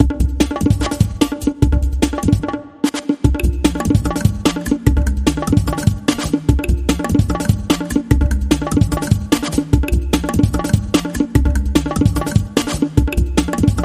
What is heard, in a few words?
Bongo